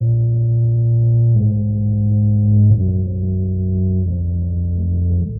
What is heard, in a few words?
bass, Loops, Sad